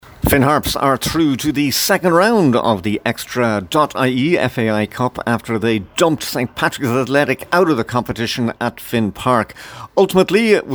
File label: harps